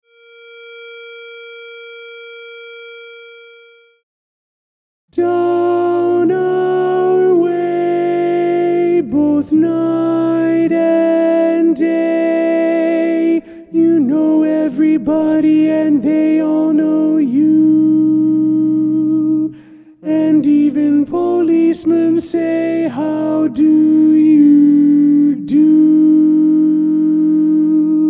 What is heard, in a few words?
Tenor Predominant